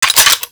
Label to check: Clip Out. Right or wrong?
Right